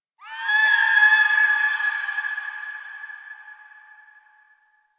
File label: Creature Distant High; Ambience; Misc Outdoor; Warframe; Infested Eris